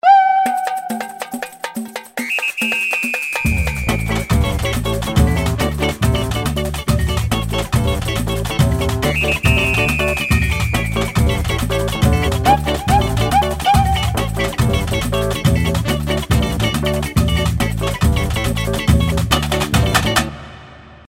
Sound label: Carnival; LG; X350